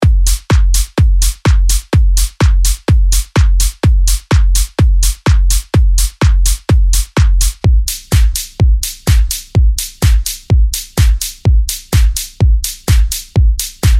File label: techno